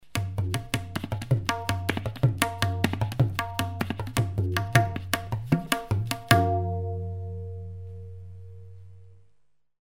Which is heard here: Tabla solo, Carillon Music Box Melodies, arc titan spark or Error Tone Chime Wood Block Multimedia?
Tabla solo